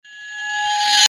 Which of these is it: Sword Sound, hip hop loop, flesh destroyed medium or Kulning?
Sword Sound